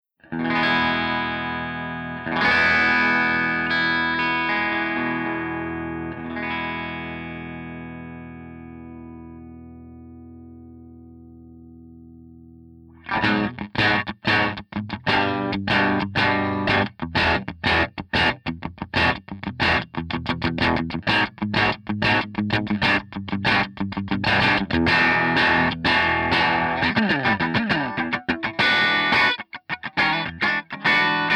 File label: AC30 BRILLIANT; RecLab